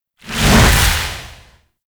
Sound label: Incarnon Slide Swing; Warframe; Weapons; Duviri; Incarnon Adapters; Melee